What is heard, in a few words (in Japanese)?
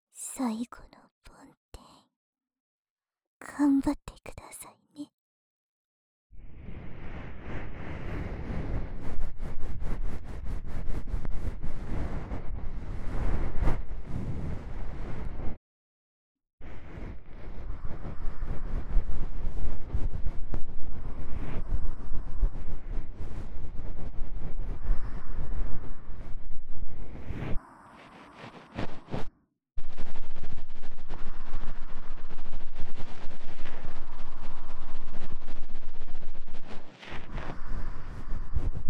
【右】梵天高速, ASMR